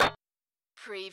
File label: anvil